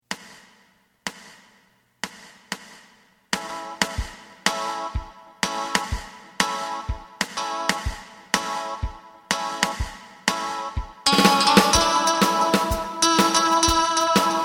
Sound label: bass